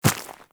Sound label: Small Walk Dirt, Footsteps; Enemies; Warframe